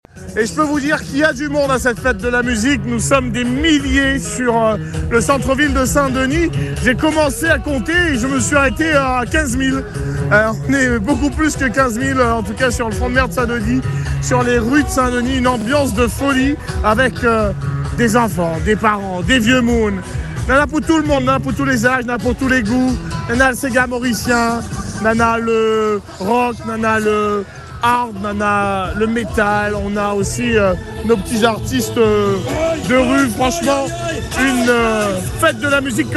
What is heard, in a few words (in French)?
ambiance fete de la musique